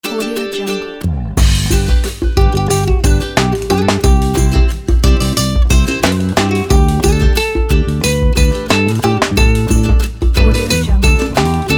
vibes of summer ukulele happy

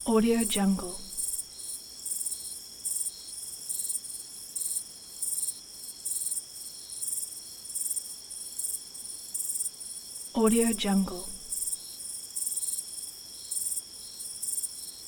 crickets; arcticroman

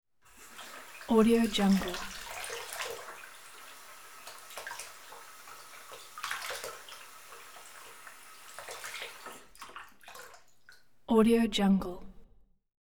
water faucet run and turn off

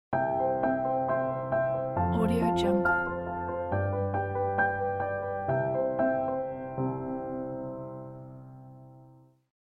acoustic piano logo

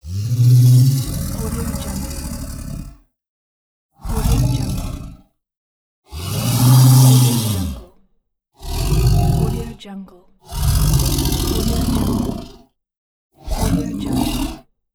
bristling creature growls

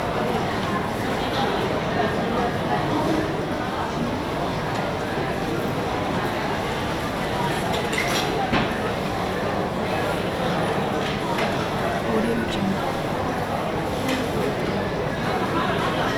shopping mall food court ambience

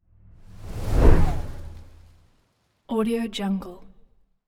whoosh tonal wind leaves

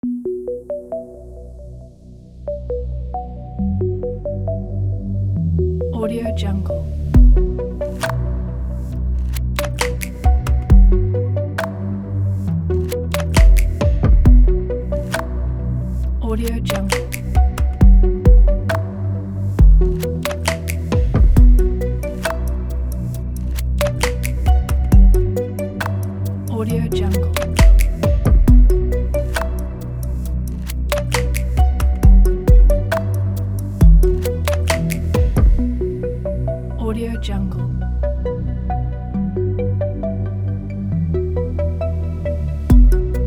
trip hop

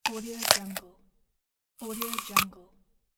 bite the apple; blackdragonfx

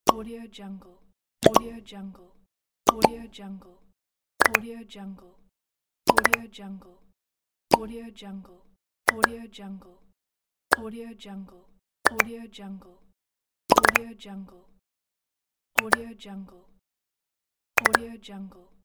pop up

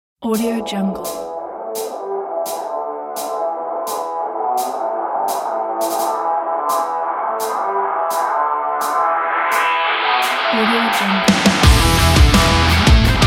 action modern hard rock

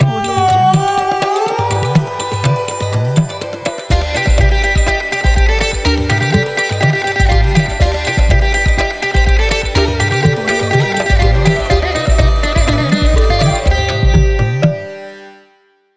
indian logo

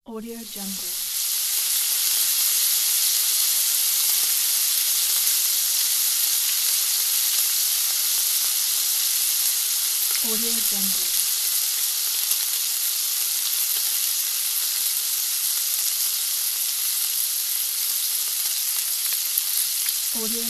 the frying; fx-library

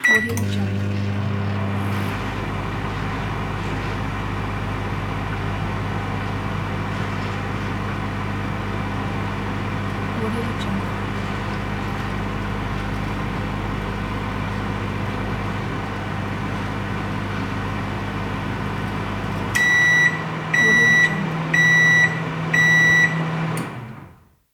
the microwave; fx library

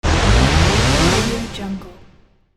big powerup synthesizer button

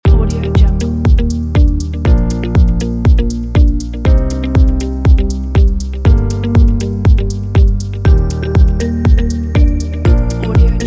upbeat corporate pack

